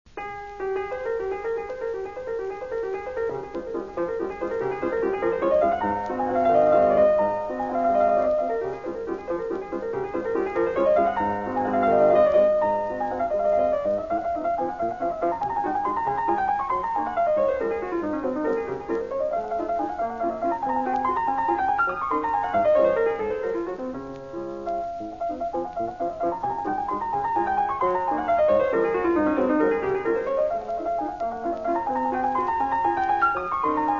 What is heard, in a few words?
Waltz; Chopin